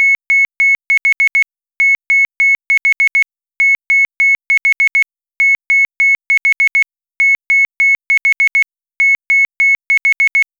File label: Tone